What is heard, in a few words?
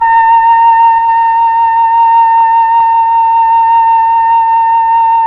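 flute A#5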